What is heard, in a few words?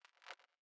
zap